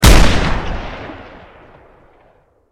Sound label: anti material rifle fire